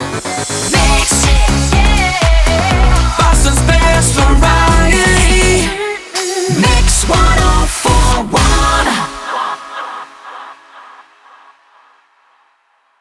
122bpm c MIX A Main ID; WBMX 2017

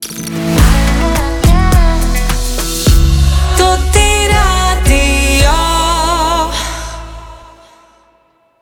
Transition Medium Slow; Toti Radio 2023

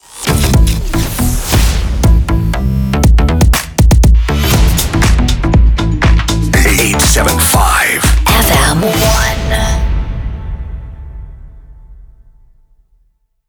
Jingle 120bpm Am B Ramp; 87.5 FM1 Manila

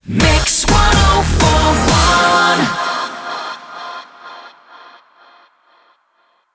125bpm f Rapid Fire

